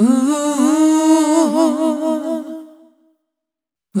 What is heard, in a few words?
Multi Voice Loops, Vocal Samples; Sonic Foundry (Sony Creative Software) - Pocket Diva World Class Vocal Samples